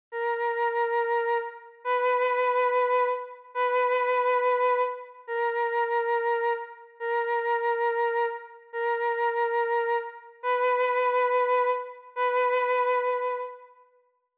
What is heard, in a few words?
ear training